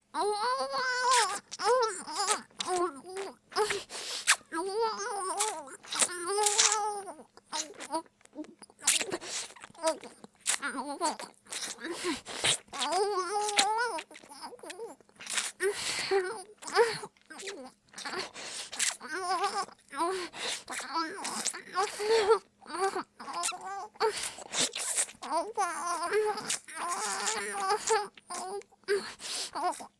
イラマチオ; 幼馴染上位

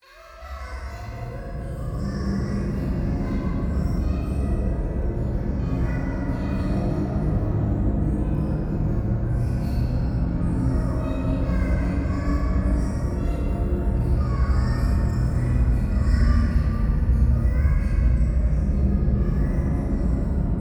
廃墟 可愛い喘ぎ 車の中

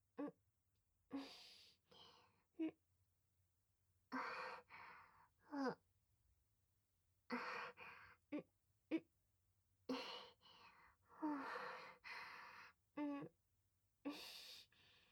息を漏らす

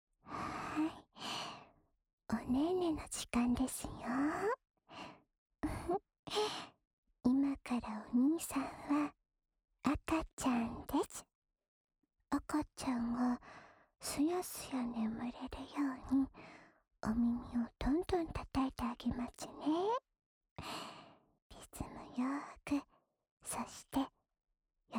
赤ちゃん用タッピング 右耳, ASMR

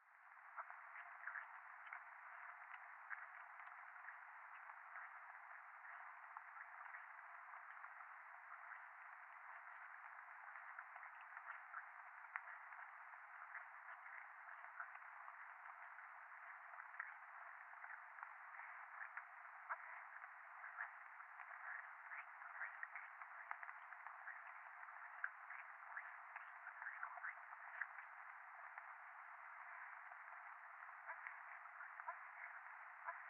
フェラチオ 雨

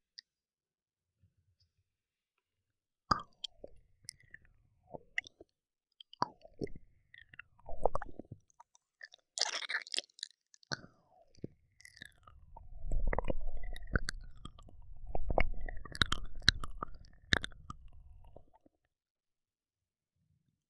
耳舐め

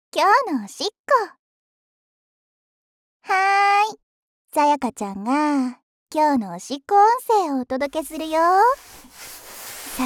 おしっこ 普通にトイレで

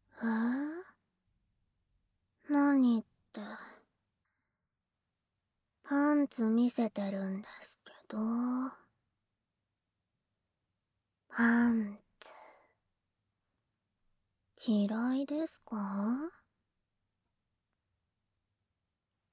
耳舐めパンツ素股, ASMR

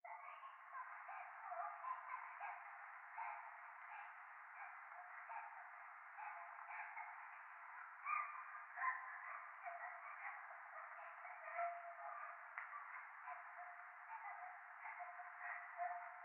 可愛い喘ぎ 雨; 教会

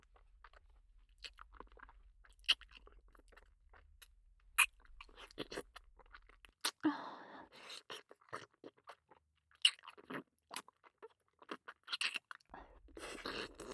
キス, ASMR

